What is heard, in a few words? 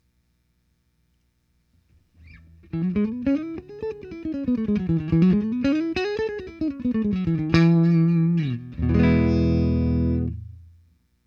Fender Twin Reverb 2 solo